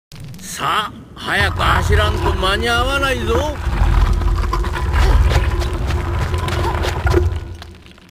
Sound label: Door SFX; Japanese Version